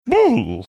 making funny sound

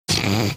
snorting

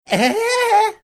imitating woody woodpeckers laugh

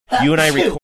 sneezing

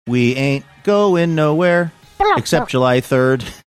imitating marsupial gurgle; we aint going nowhere

